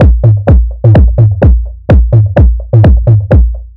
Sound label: Minimal Funk, 127 BPM, Percussion; Sonic Foundry (Sony Creative Software); Underground UK House & Electro